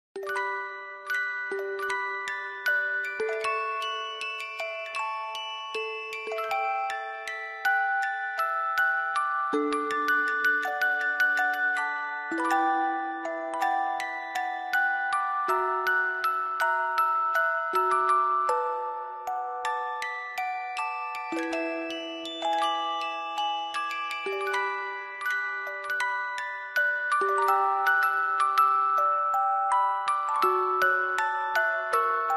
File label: music box ver.